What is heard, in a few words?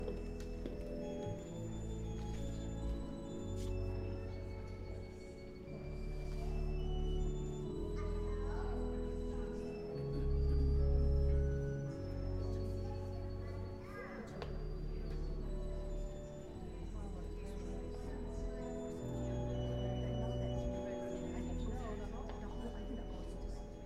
Cathedral Organ; Zoom H4